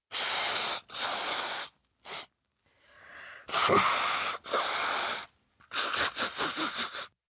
Blow Nose Quiet, Blowing nose, female, cold, sick, (human voice); VoiceBox